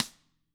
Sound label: CAMCO SNR; ILIO; Double Platinum Drums 2